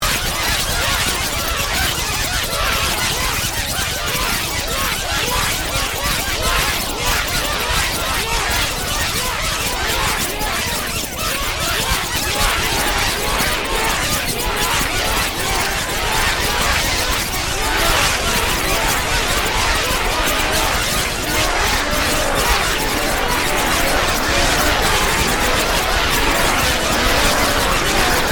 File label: Created Ambience The Cacophony, ambience created through sound manipulation; Artificial; Various ambience created through sound manipulation in FMOD